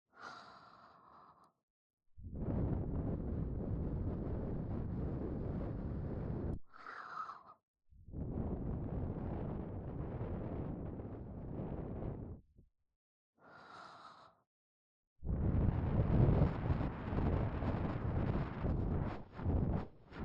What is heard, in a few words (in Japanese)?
【左】耳ふー, 耳かき, ASMR